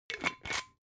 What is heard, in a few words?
glass bottle massive close fast